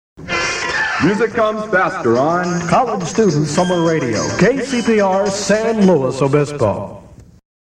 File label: Summer IDs Jingles